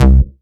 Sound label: Bass C, Offbeat Bass; VEC1; Club